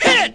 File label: hit, Stabs